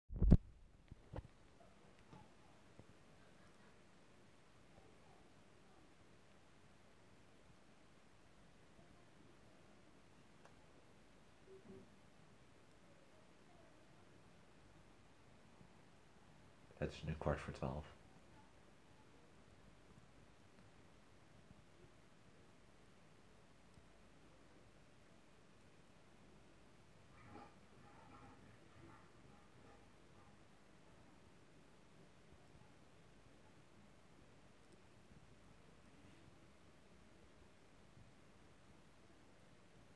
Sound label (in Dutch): Overlast geschreeuw hond